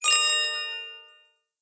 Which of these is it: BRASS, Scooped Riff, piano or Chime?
Chime